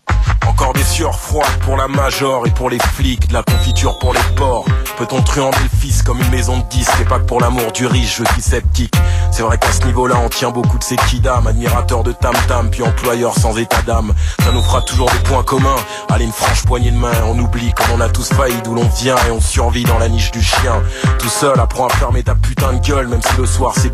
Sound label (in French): Chanson